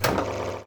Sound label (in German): Kugelabschuss